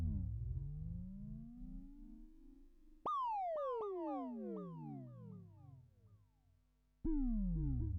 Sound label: Trance